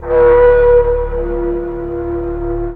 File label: Hit; Blu Mar Ten; Jungle Jungle - 1989 to 1999 Samplepack